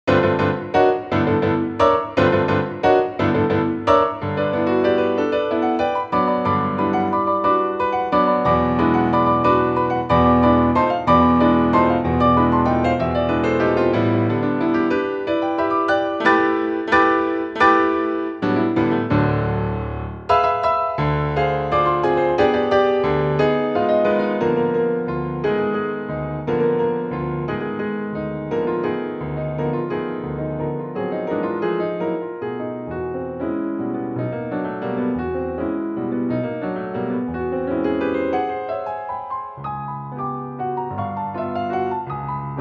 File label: valses nobles piano; ravel